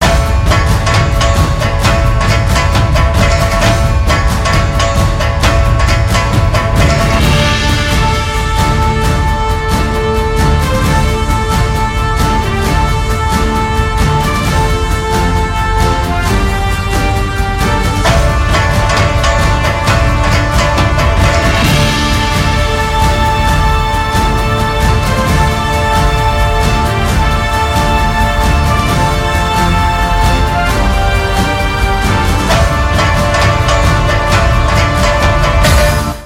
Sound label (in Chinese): 琵琶